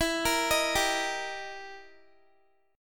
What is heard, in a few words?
E Minor Major 7th Flat 5th, E, down, Guitar